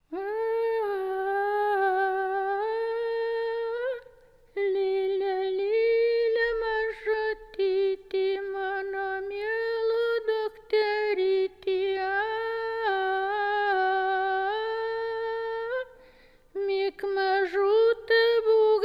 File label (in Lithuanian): Lopsine